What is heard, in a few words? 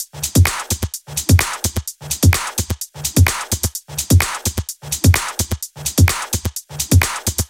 128BPM Simple Kit; VFH1 128BPM The Simple Kit